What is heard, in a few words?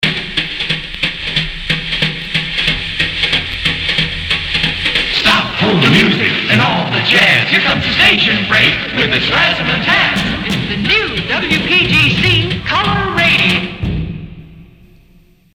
jingles, stop all the music; pams series 2; razzamatazz